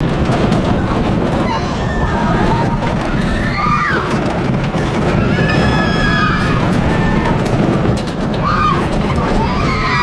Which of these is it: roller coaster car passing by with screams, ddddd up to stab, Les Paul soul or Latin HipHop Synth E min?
roller coaster car passing by with screams